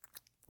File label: lip sound; SEXUAL sounds; ROBLOX GAME ASSETS